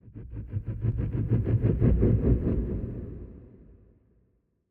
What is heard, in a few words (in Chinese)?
玄武岩三角洲活动声; ambient